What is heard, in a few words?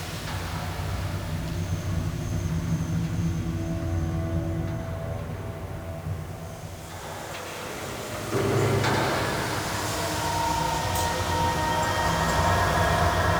Amb Loop, Interior; Warframe; The New War; Zariman